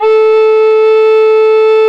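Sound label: MOECK alto A 2; SoundBytes - Early Patches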